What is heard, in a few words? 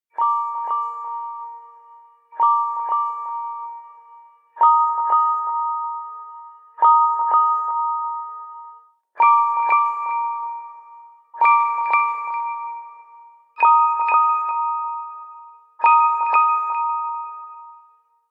Zen, Google Pixel ringtone